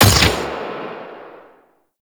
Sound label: Fire Shot; Warframe; Weapons; Duviri; Incarnon Adapters; Incarnon Sybaris Prime